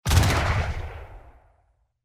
Muskets; Age of Empires III; cinematics

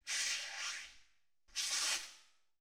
Zing; Title Sequence Effects; Sony Pictures Sound Effects Series

